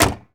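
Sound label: Truck Cherokee Hood Close; SFX Kit; Trucks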